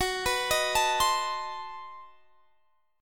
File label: B 7th Flat 9th G flat